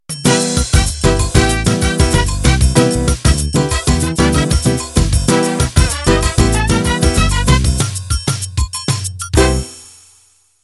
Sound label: Samba